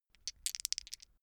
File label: dice shake hands open short